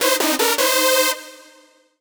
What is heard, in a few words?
custom synth